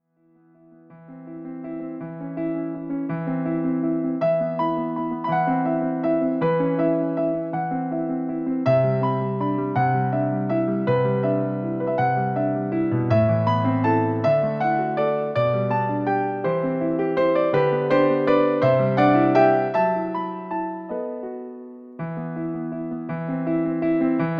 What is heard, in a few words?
Classical Piano